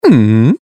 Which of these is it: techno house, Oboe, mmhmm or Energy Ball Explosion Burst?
mmhmm